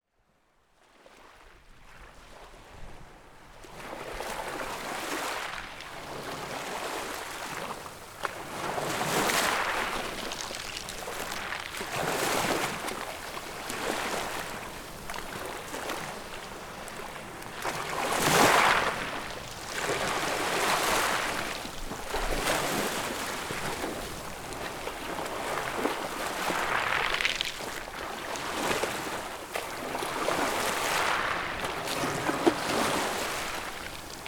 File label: beach tide on rocks near lot; seahurst